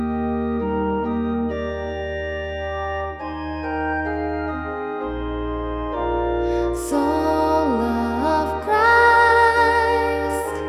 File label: voice and organ